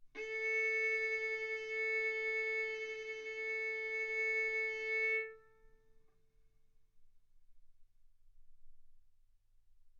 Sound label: Violin arco mf sul A A4 B4 stereo